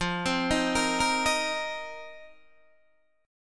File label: F 7th Suspended 4th F, Guitar, DADGAD